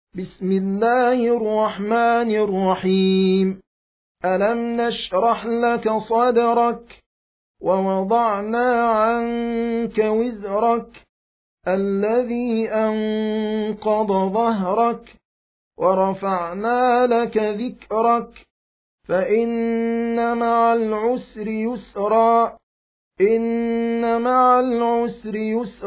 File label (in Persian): ترتیل; سوره انشراح